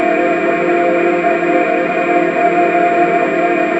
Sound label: F3 glas vo; Northstar - The Wizard VOL-1